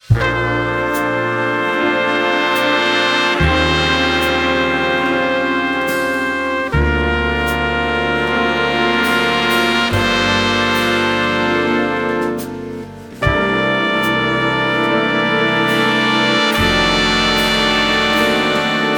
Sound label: sequential warm ups for intermediate jazz ensemble; kendor music